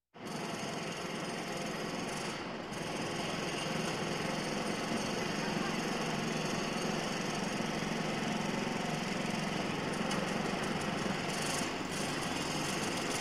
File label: Construction Site Ambience